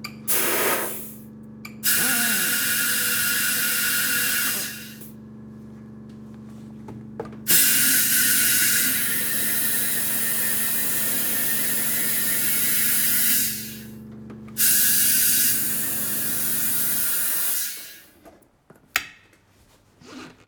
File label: car air pump, street traffic; best service Blue Box